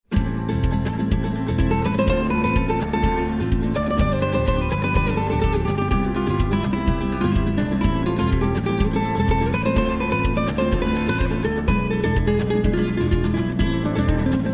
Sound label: Rumba solo rhythm